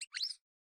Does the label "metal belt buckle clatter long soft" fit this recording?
No